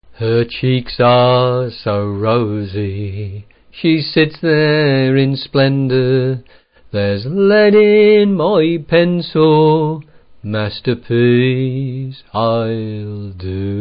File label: Pencil Sharp